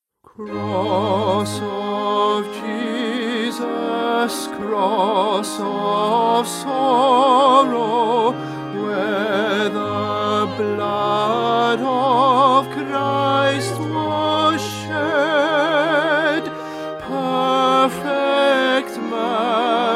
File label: Tenor; Stainer; Crucifixion